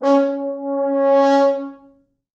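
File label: 4 French Horns; East West; Quantum Leap Horns Sax; 4 FrHorns fts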